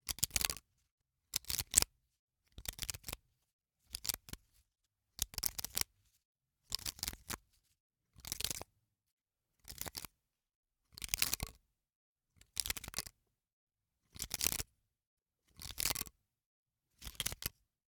Hwatu, card game, hard plastic, small, clatter, stack, pickup, drop, loose, various, long; Eiravaein Works; Vaeyan IV